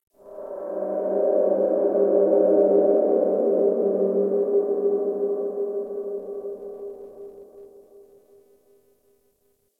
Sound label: Space Exterior Dist Call, Ambience, Space Battles